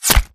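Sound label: impact bullet generic headshot human; Titanfall 2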